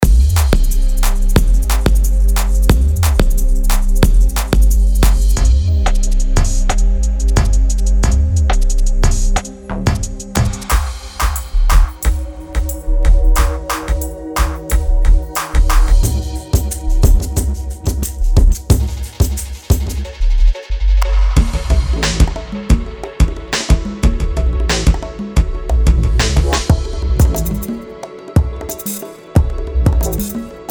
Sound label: kick; channel robot